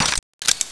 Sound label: clip in; brainbread